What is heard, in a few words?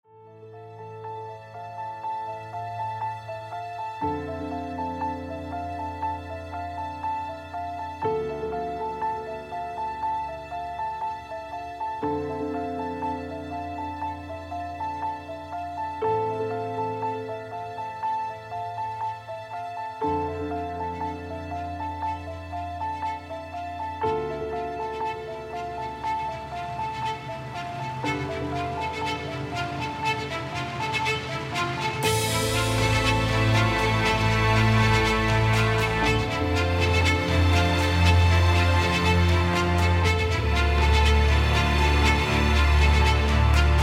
Overture